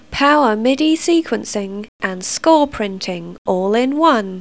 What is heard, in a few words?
ballade